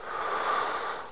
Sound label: Breath Light; Player; Heretic II Demo